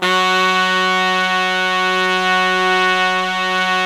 BRASS SECTN, Pop & Funk Brass; Best Service ProSamples vol.25; AKAI

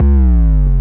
RAVE, RAVESYN

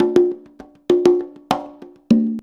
CONGA, Modern Percussion Loops; Soundscan vol.56; AKAI; CONGA 10G

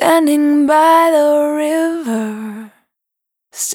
Dry, Single Voice Melodic Loops, Vocal Samples; Sony Creative Software; Pocket Diva World Class Vocal Samples

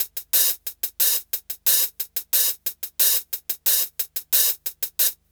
Hi Hat Loop

